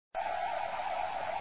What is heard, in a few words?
sfx Loop